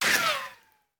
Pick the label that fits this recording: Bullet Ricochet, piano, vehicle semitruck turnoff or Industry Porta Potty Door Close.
Bullet Ricochet